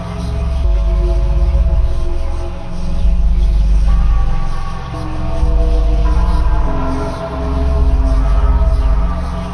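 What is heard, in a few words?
ambient creepy bells; hellhole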